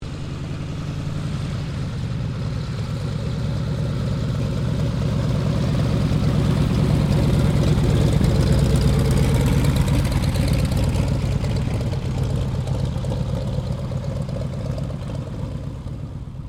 AIRCRAFT CESSNA 195 TAXI; Airplanes; Vehicles; Aircraft Collection; Twistedtracks

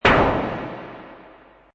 Space Scifi Sound White Blast; Sci-fi space white noise burst; Sound design element for space blast or exp; Lynne Music

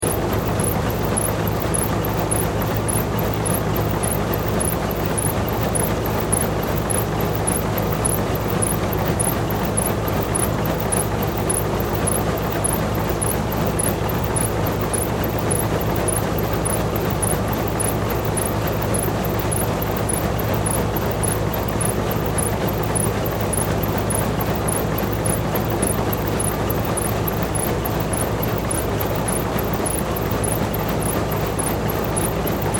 Industry Conveyor Belt Loop Squeaks; Conveyor Belts; Machines; SFX Bible 6

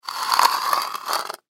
Scrape Plastic; Scrape Drag On Cement; Scrapes; Foley; Sound Effects:Objects; SoundEffects-Blastwave

